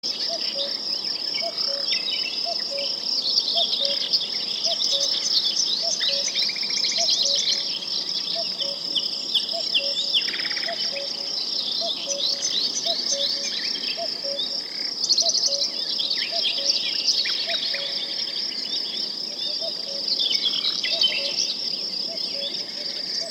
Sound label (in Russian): zvuki prirody les 3d sound